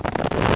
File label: card shuffle; Boxcars Casino